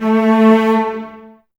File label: CES A 3, Cellos Detache; Miroslav Vitous; String Ensembles